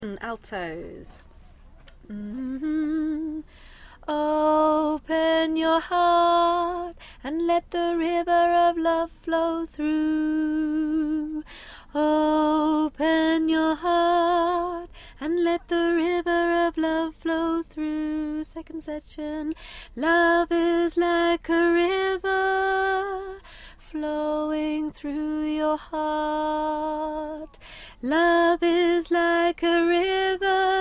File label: (alto); Choir